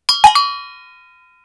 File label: reso cowbell single shot; percussion; single hits one shots